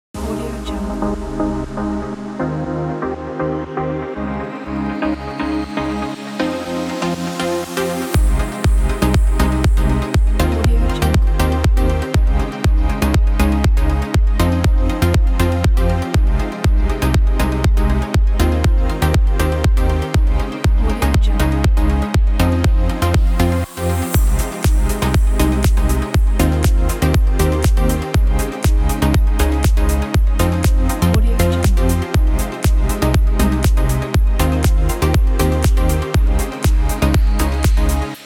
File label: background house electronic